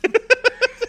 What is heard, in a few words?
giggle